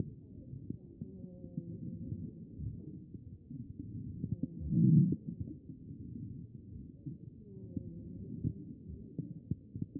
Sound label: chest; B MODE